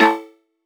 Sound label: roulette